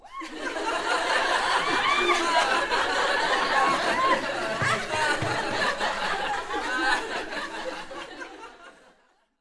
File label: Medium Group Laughing